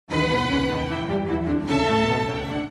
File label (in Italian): Cadenza